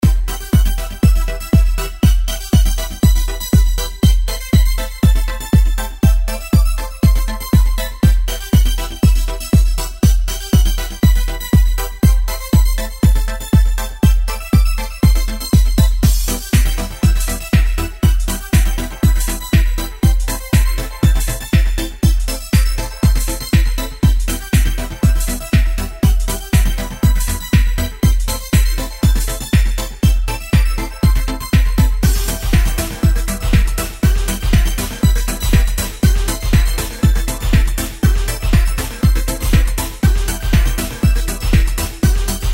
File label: Dance Loop 120BPM; The Happy Bride